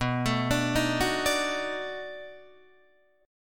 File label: B 7th Sharp 9th, down, Guitar, Open D